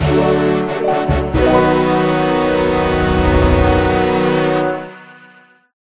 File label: Slayer level up